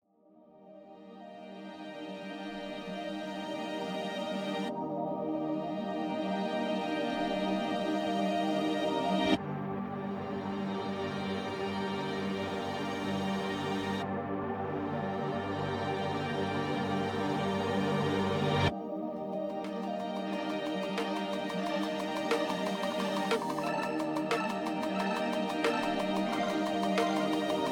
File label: ARP